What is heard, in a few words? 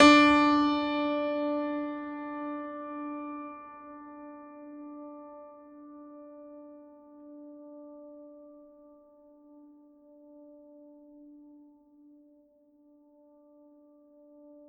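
Classical Piano D3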